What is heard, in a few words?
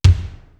metal kick; East West; Global Sounds